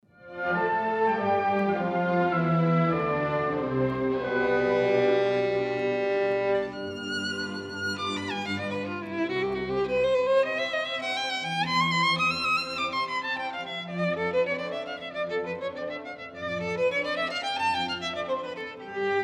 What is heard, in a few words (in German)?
Violine Dry Vinyl; Meilen